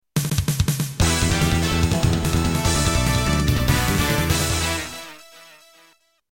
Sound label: Music Victory